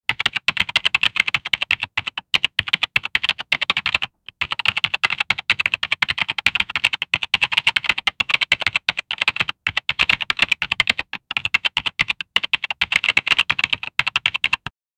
clacky keyboard typing; busy

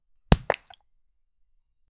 poison puddle impact; liquid

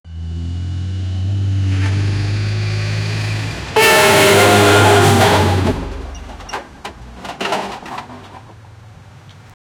truck crash sound

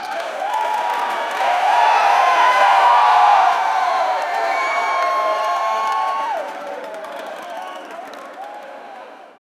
a crowd cheering, stadium

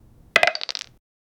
fish falling in a crate; animal

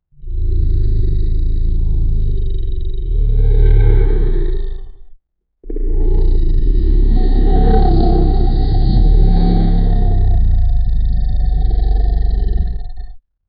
the roar of a dinosaur, prehistoric

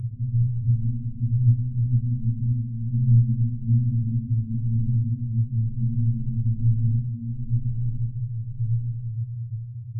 very deep underwater whal, marine

